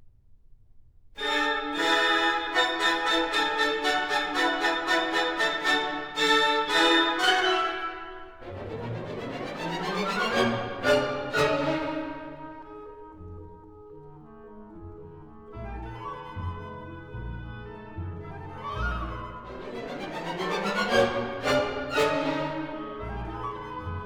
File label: Etudes for orchestra; Stravinsky; Madrid